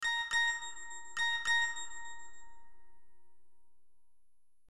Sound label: Whatsapp Samsung S21